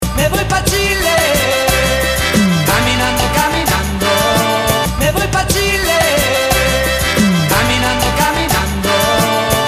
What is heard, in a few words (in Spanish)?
estribillo